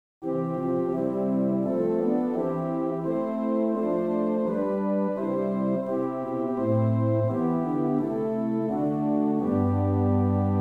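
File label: Swell Gambe 8 + Celeste 8, organ; First Baptist Riverside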